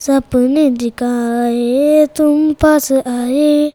CHILD VX C, Indian Traditions; USB Soundscan vol.45; AKAI

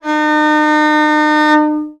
VIOL D#3, VIOLON, Arabian Traditions; USB Soundscan vol.43; AKAI

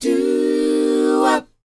DOWOP F, Vocal R&B DOO WOP; ILIO; Vocal Planet

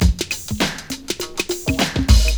BEAT, Beats 101 bpm, Hip Hop; E-MU Formula 4000 Series Vol. 1; Hip Hop Nation

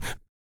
PURR PUTT, vocal; ILIO; Vocal Planet VOL-2; Blues & Soul

